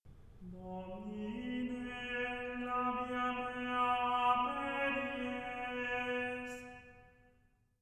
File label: Gregorian Chant; Miserere; Psalm 50; Domine labia mea aperies